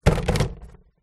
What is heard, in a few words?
sport soccer ball drop into plastic tub; zapsplat